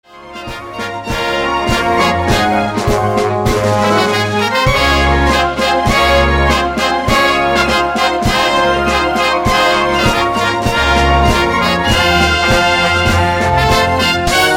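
Processional, Live Orchestra Musical Theater Backing Tracks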